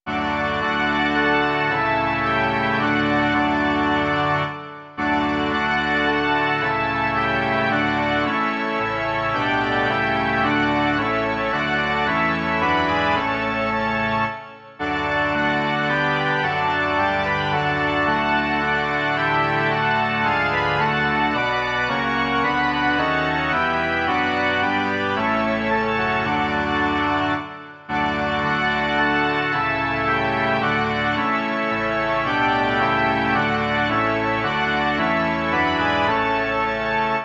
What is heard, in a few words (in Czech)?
varhany